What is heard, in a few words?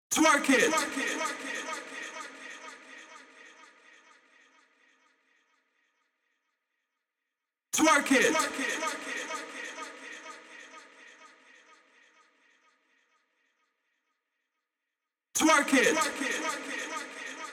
Vocals, Future House 126 BPM E; Big EDM; Future House Tools; Construction Kits; Twerk It